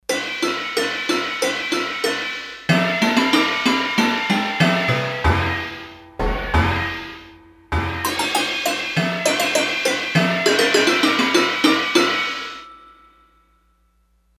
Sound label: Balinese Hit